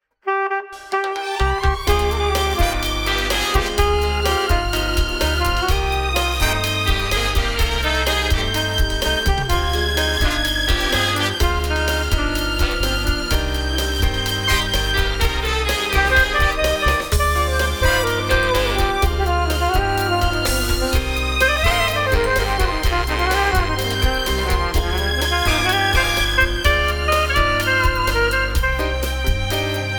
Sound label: Saxophone Quartet